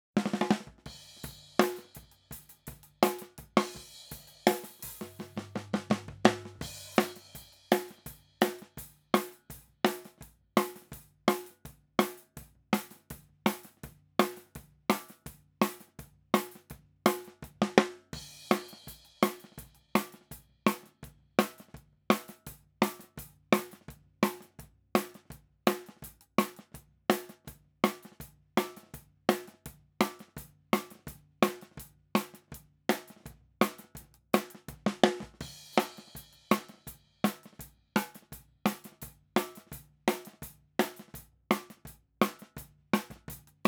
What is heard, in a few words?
Snare; BMW Blues EWU